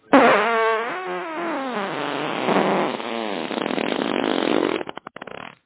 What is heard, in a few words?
farts